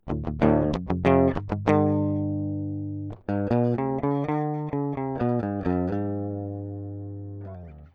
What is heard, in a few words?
clean